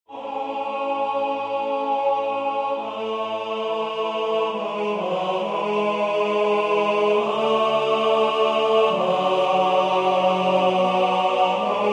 tenor; O Crux ave

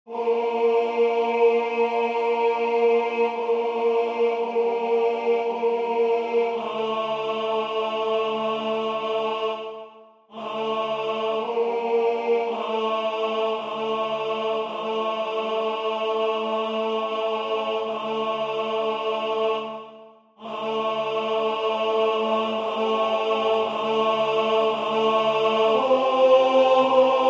Iste est panis tenor; Gauthier

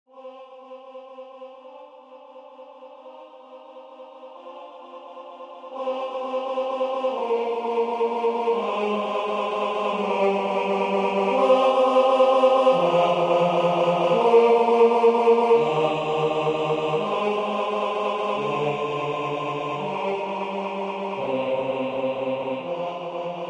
Alleluja basse

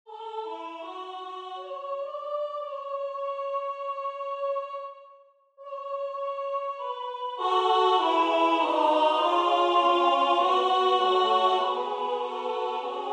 tenor; Ave Maria; Victoria

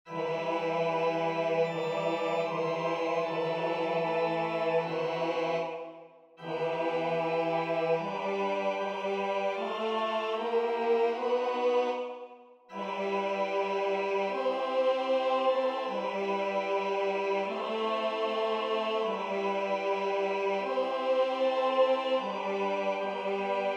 Ave Maria basse; Franck

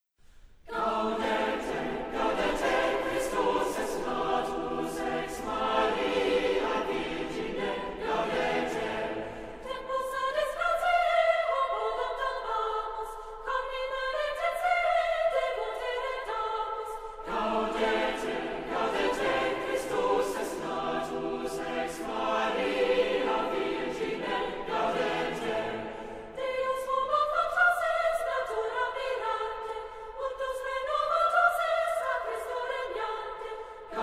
Gaudete Christus est natus; couplets differents

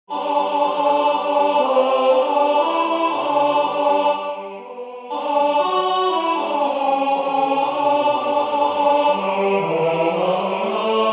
Jubilate Deo tenor; Mozart

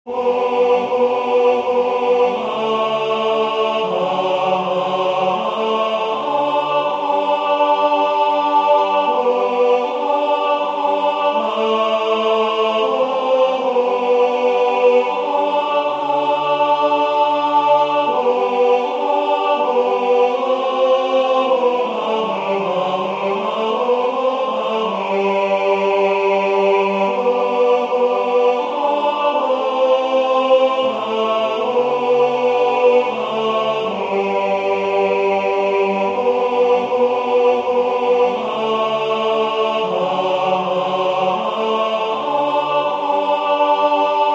O salutaris Hostia tenor; Mozart

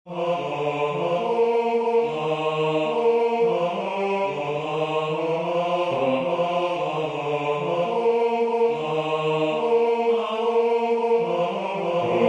Venez divin Messie basse

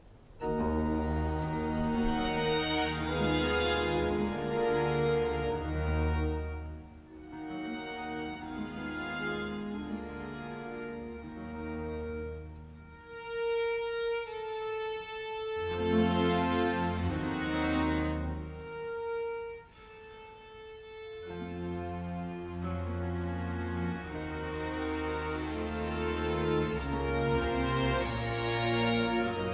Sinfonia; BWV 4

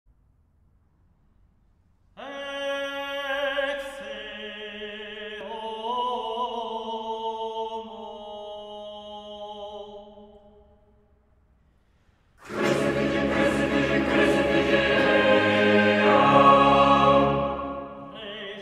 Ecce Homo Choeur; Bouzignac; de Grenelle

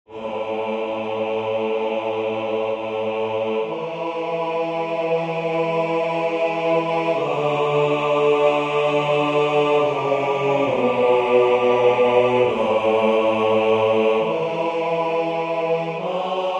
O Crux ave basse

